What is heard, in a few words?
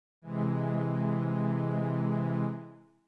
Ab Augmented